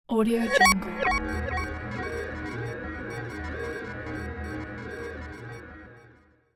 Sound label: stage clear